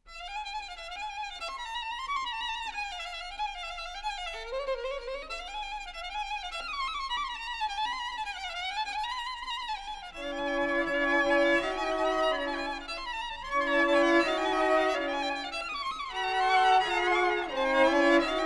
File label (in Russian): симфонический оркестр, Симфония No. 1 фа минор